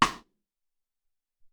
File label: Rim Shot, Alesis SR-18